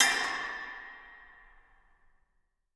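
Brass Post Hallway Cymbal Crash, Music Hall Percussion; Aberdeen Music Hall; Drum Hits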